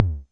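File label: mattel tom, Mattel Synsonics drum kit, lo fi; 8 BIT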